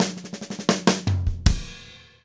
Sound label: DOODLE, Drum